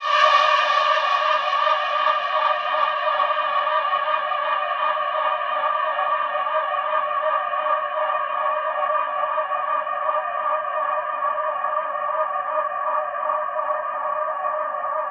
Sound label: vocal atmosphere, D-; mixready